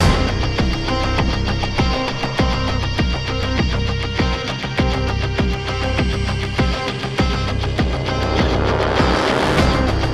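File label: music, no brass strings; transformers war for cybertron gamerip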